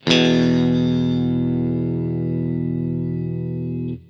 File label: enter; lara